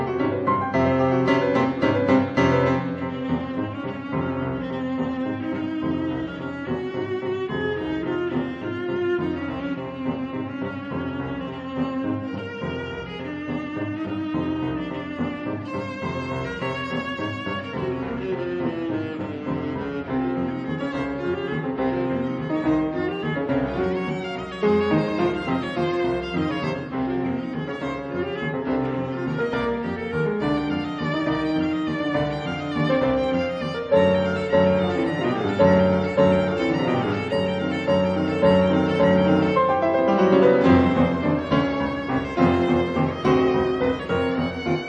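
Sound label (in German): Sonaten für Viola Klavier, viola piano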